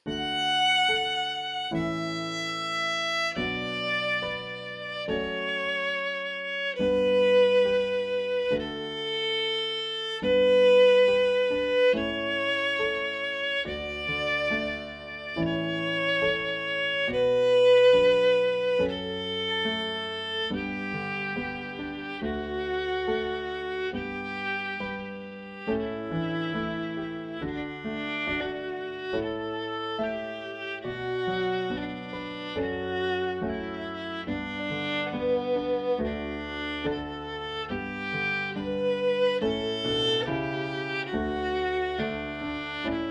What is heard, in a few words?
Violin